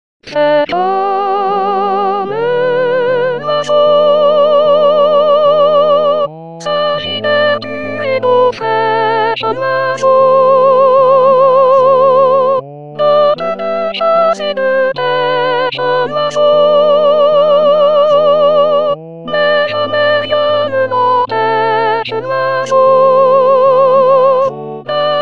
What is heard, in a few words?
Soprano